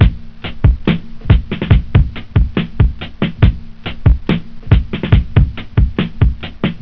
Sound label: drum